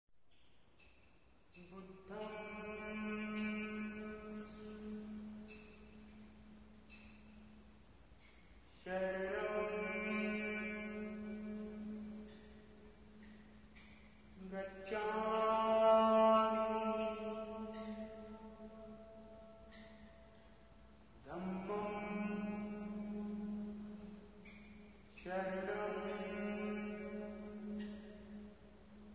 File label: Fusion Music Raga; RKC Live Stream; Travel India